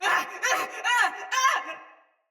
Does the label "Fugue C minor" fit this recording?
No